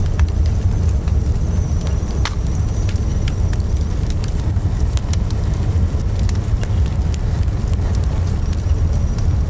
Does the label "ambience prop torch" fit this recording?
Yes